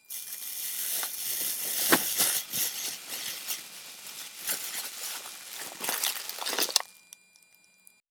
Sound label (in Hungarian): zajosság; 5 hang zajossag